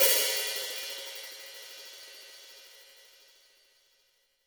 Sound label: HiHat & Crash, Roland TD-20; HiHats